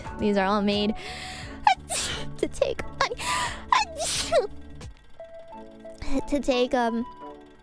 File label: Sneeze